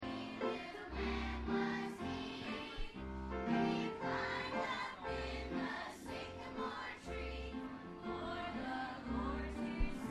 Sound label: children singing